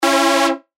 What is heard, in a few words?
Synth Shot C#; synth shots tech house; Frozen; revealed